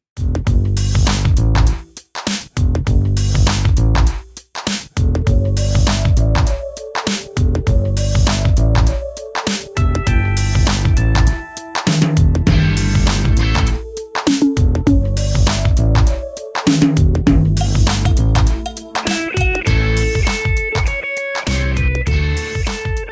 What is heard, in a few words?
Instrumental; I've Got Peace Like a River